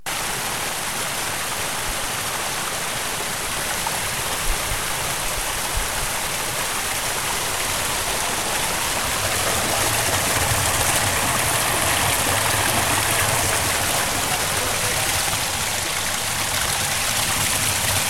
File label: cascatella